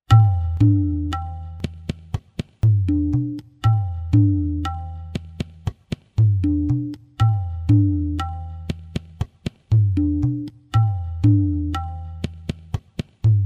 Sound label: tivra, tala